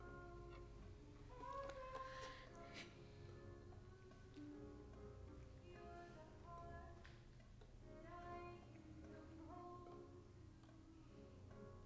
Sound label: violin